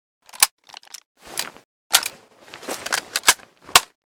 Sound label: load, weapon; metro